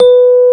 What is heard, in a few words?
electric pianos; Wizoo Electric Pianos